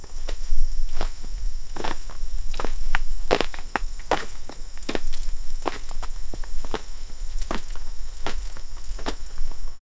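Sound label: anime walk on street floor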